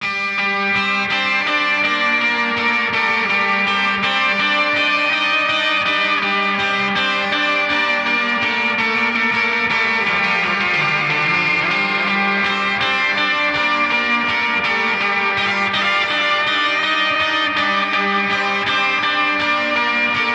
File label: Chorus Lead Guitar, modern metalcore